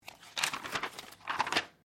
Book Page Heavy Turn; Book movement; Books (Sound Effects:Offices)

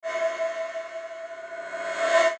METALLIC SHIMM, Metallic Shimmer Tremolo Swell; Metallic Sound; Blastwave

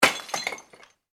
impact rock rubble; Materials; Impact - Rock Thrown / Dropped Onto Rubble; Rocks; McKinneySound

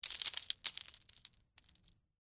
Texture, Debris, Spill, Sugar, Cone, Waffle

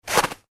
human footstep snow icy; Single Footstep On Icy Snow; McKinney Sound

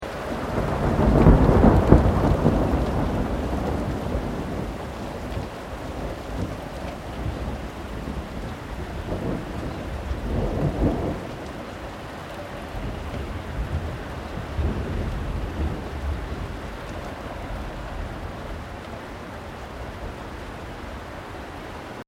THUNDER DISTANT LOW RAIN

